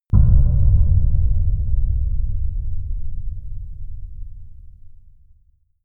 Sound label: dark wooden hit